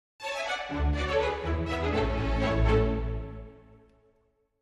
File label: Clarinet Sting